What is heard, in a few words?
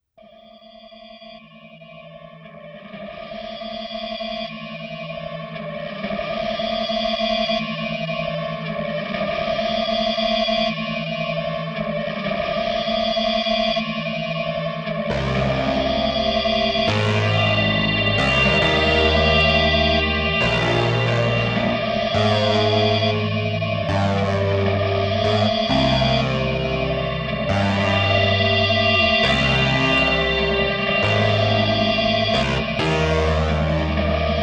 Echo